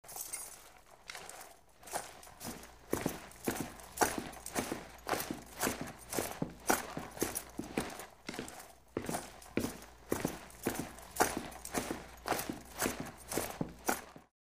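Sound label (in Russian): rustling of clothes when walking jingle of keys in pocket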